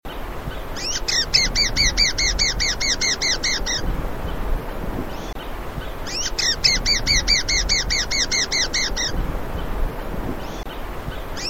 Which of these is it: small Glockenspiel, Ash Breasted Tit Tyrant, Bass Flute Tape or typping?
Ash Breasted Tit Tyrant